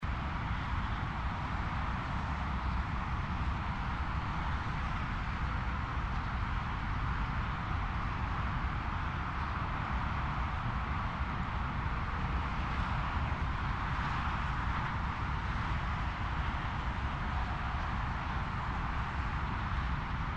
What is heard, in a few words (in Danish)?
Motorvejsstøj optaget ved siden af stillebænk